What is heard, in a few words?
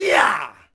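Axeman Attack Vox; Civ4 warlords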